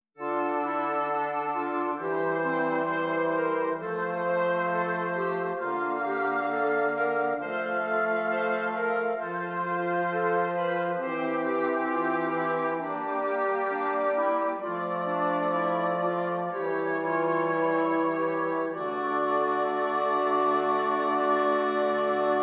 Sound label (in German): Stimmiger Contrapunkt; Ronge